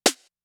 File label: Snares, Elektron MachineDrum SPS1 MKII